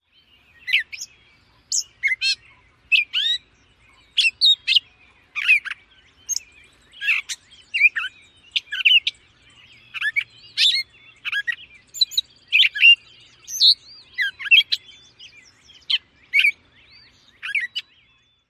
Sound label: song